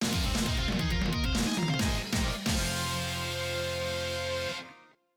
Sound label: Story Win; pro yakyu famista 2020 switch gamerip 2020